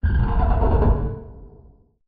Ambient

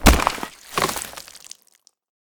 Body Horror Exchange; SCP

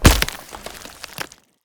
Body Horror Exchange; SCP

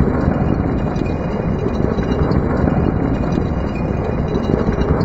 Stone Drag; SCP

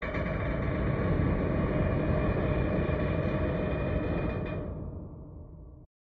Ambient; Zone2

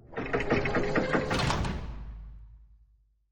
Door Close

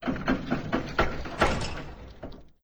Door Close

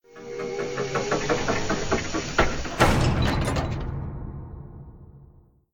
Big Door Close

